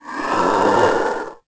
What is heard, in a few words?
moan; The Elder Scrolls III Morrowind GOTY; XBOX